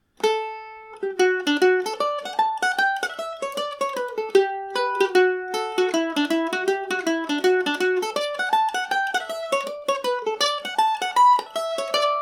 liverpool hornpipe normal session speed version, mandolin